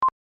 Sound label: countdown beep